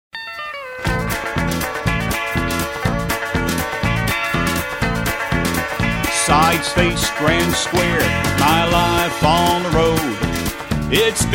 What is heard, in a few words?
vocal